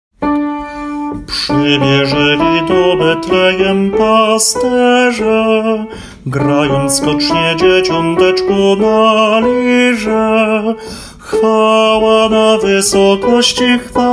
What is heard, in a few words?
TENOR; Przybiezeli do Betlejem